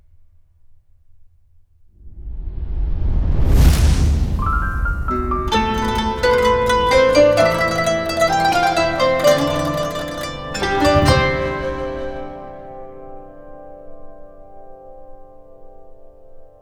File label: Oriental Instruments; DCAA Oriental Instruments